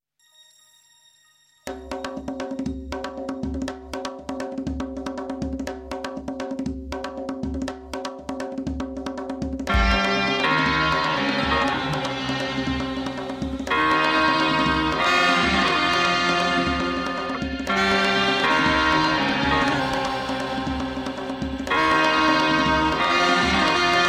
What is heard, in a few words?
arabian mix